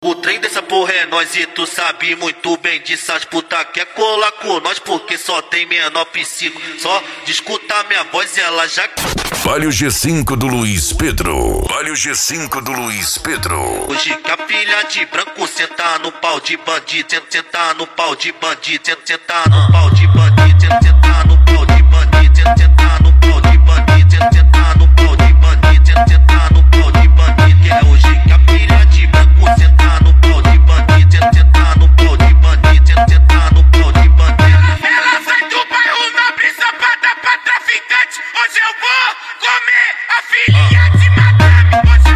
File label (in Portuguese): funk mega bass e forro; urupa ro